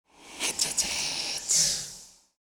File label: eyelander idle; gmod; durgz; meth